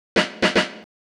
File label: 114 Drum Roll